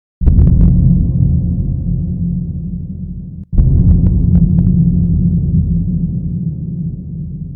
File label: Bomb Sounds Of Music